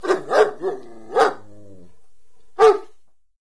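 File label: rural random dog big; random amb sfx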